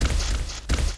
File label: Shadow Dragon Back; Seer; GamigoNA